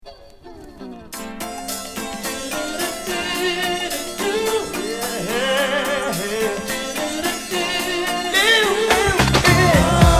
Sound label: Hip Hop Version